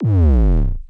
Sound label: Bass Line